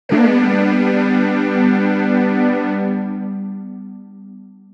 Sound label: Porto Pad, jup8